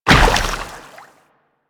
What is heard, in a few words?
Combined Step Water, Footsteps; Enemies; Warframe